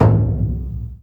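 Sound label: loud drum, Burundi; Heart of Africa; Spectrasonics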